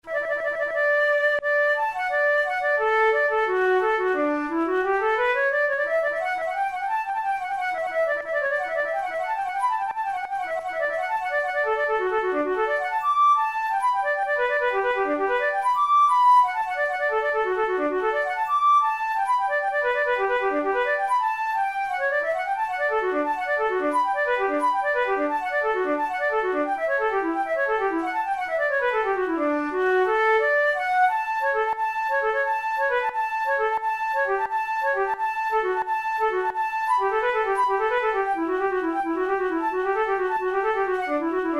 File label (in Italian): capricci per flauto traverso; ruge